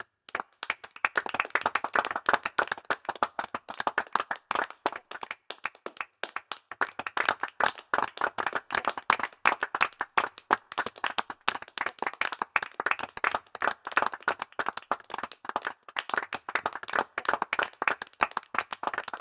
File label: applause small